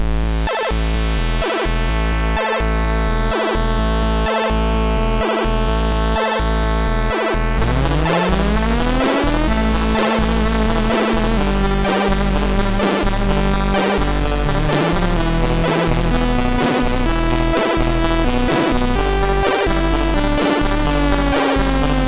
Not Goud At Alsa, MOS6581R3; hvsc

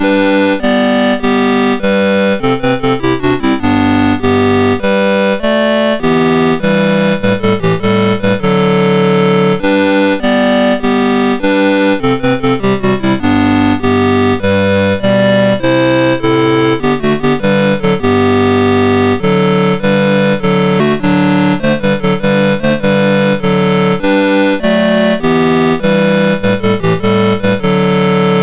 Praise Him, MOS6581

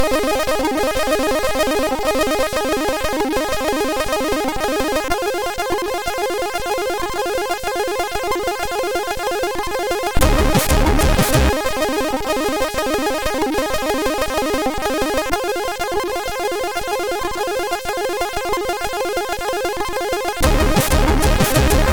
No Name party version, sid, MOS6581R3

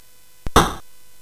Space Gun

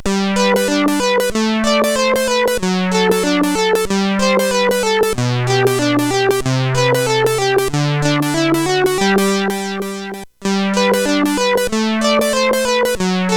Instrumental Compositions, CSG8580R5

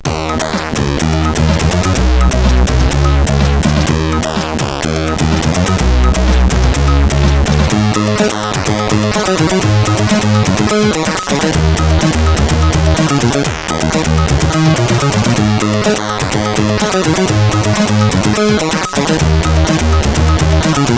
Remix, CSG8580R5

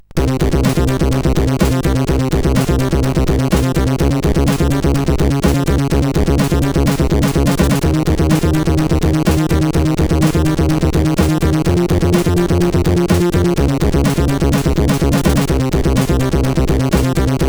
Techno Vision, CSG8580; CSG8580R5; sid

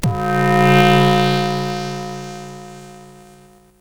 Jingles, sid CSG8580R5

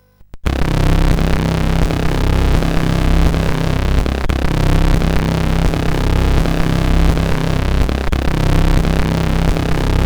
Fly Bird Fly, CSG8580R5; sid

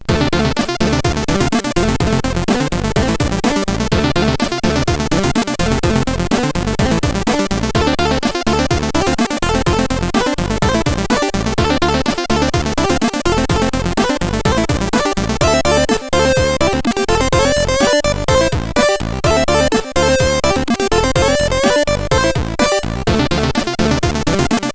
Sweeper, CSG8580R5; hvsc

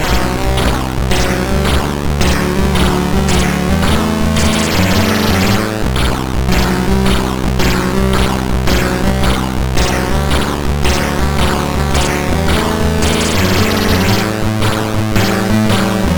sid MOS6581R2, GAMES; F1 Manager

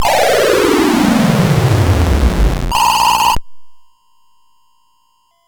Dragonworld sid MOS6581R4

